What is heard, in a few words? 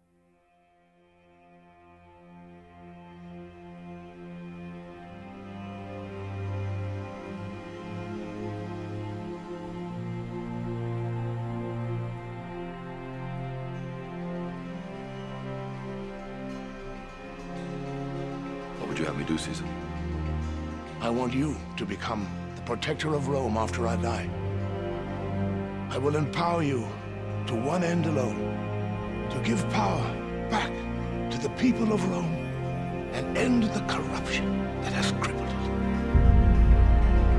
instrumental; Gladiator; آهنگ های فیلم گلادیاتور